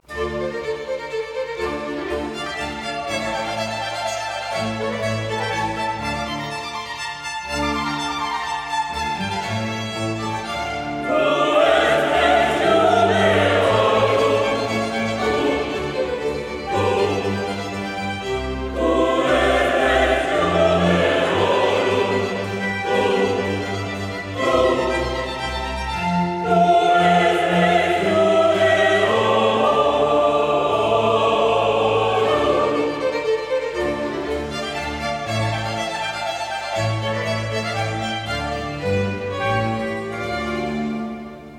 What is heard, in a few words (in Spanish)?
Coro; Tu es Rex Iudaeorum